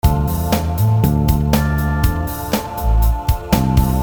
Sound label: Loop